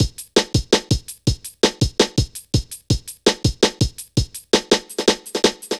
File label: Drum Loops, Jungle